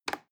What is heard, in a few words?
plastic button little push regular